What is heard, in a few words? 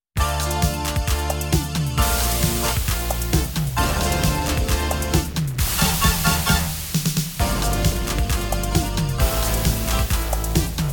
Storm & Thunder; Weatherpad